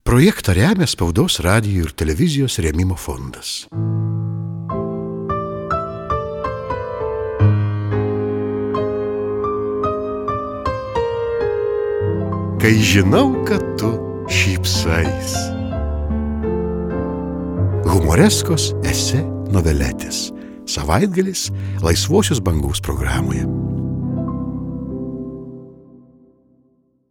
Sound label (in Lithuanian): dzinglas